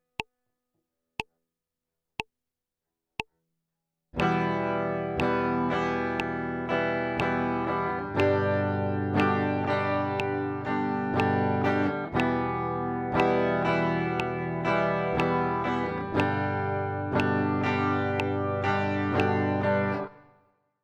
intro strumming em g, guitar

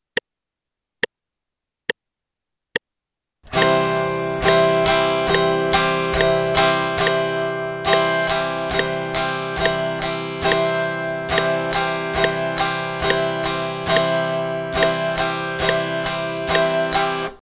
C strum in eighth notes pattern, guitar

